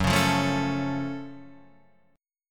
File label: Gb Minor Major 7th Gb down Guitar Half Step Down